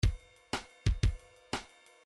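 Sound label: drums slow rock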